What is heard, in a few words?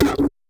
ship change; spacetac